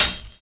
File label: SONAR BEA; Univers Sons - Kitch 70s